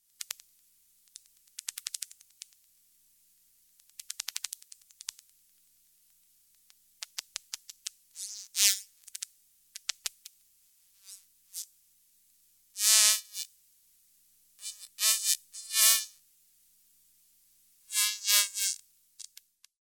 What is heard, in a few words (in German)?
Schweinswal Phocoena phocoena; tierstimmen